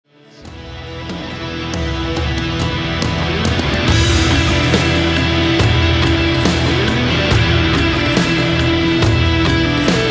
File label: GUITAR SOLO